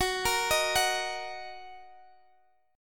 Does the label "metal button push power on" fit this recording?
No